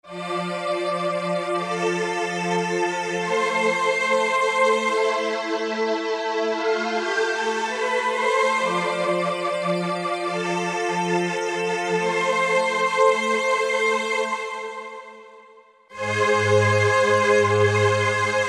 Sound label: Silk Pad Hi, Korg MSC-12S Synth; Synth 3